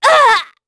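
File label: Vox Damage, Korean; Glenwys; kingsraid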